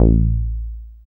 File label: Bass SH-101